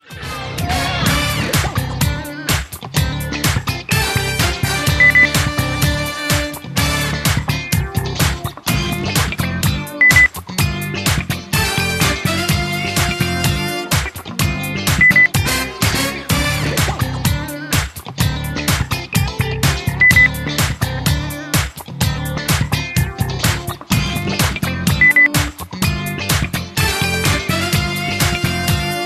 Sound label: groovy